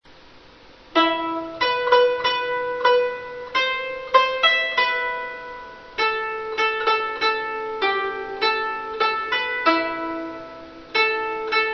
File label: zheng